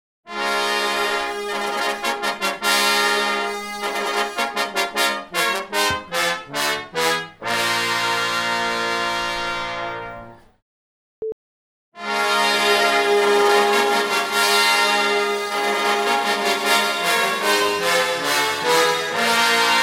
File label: Intro Brass Before After